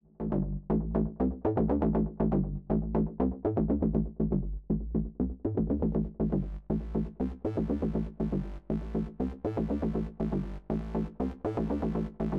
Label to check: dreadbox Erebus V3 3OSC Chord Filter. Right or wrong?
Right